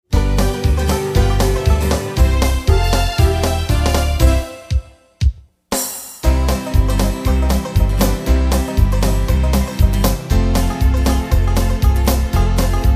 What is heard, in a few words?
Jengle instrumental; Basilio Vilanni; deputado estadual 1998